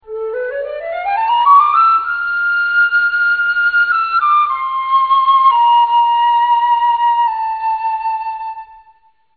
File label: flute; Musical Instrument Experience - The Orchestra